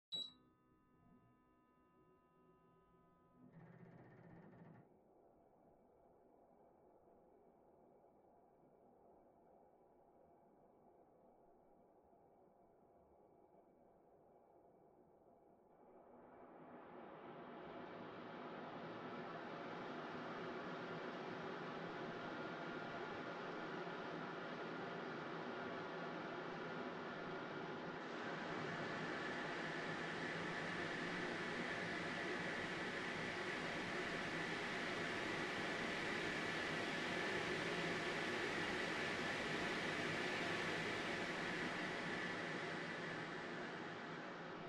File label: Air Purifier Far All